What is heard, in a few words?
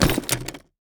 inventory loot open lockbox; war ui; esmteam